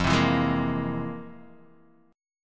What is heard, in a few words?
F Minor Major 11th, F, Guitar, DADGAD; 3,3,2,1,1,x; down; chord shape